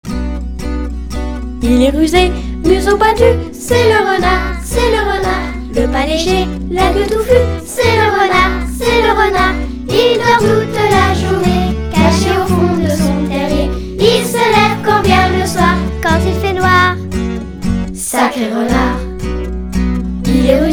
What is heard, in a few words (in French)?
Chanson Sacré renard